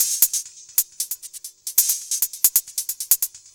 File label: Drums Techno